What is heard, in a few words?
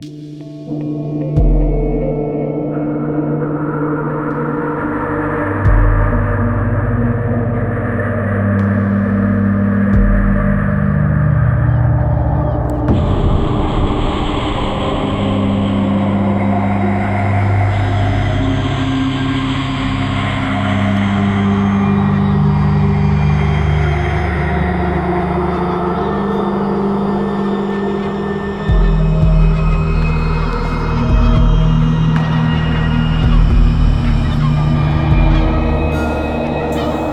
seagulls; weeklybeats-2016